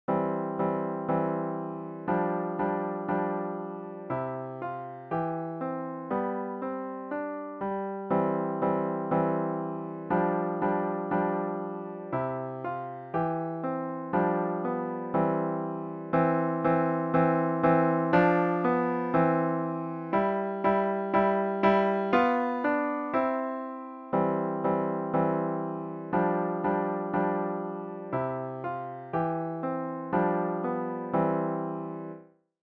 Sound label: piano